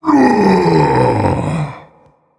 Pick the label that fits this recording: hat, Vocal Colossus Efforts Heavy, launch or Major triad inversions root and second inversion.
Vocal Colossus Efforts Heavy